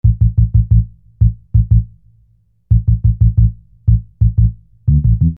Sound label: Sine Bass